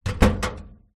household microwave oven door close recorded inside